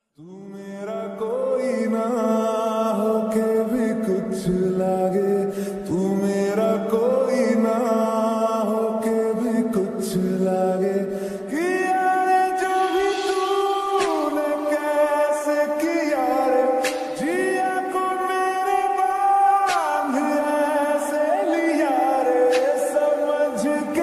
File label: ringtone romantic love ringtone hindi ringtone